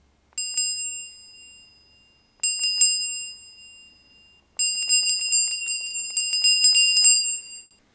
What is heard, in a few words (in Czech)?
zvonecek